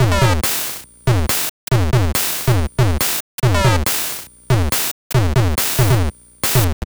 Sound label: Drums, 140 BPM; CHIPSHOP DELUXE; CHIPSHOP LOOPS